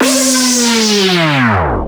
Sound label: filler tool 128 C; Electro; filler tools; Anthemic